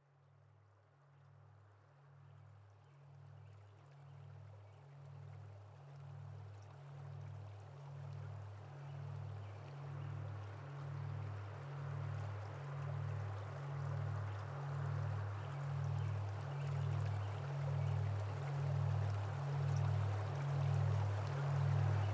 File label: Nature Sounds, Delta, Beta, Gamma waves; sleep